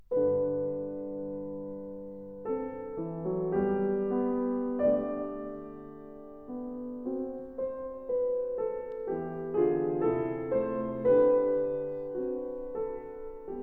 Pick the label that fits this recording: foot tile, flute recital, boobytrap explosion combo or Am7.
flute recital